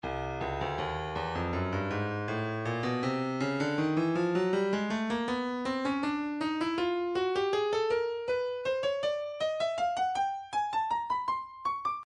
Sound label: breakbeat midi files 080 084 bpm; Stylus; shakey b